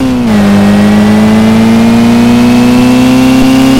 3rd gear, vehicles

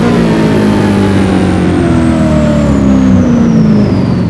throttle off slow, vehicles

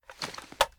ar drum reload end; weapons; akilo47; viper

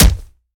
melee character fist; viper

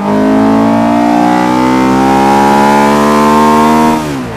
second, vehicles; gmod; tdmcars; s2000

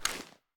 ads down; gmod; viper; weapons

